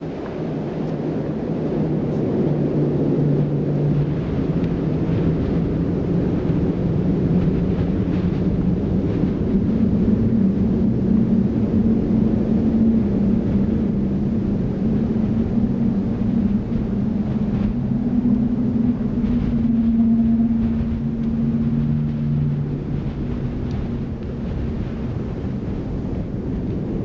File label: Ambience Wind